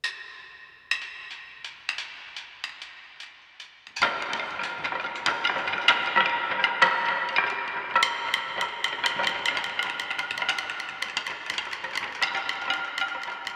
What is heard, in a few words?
textures, sticks solo ensemble and textures; pssst instruments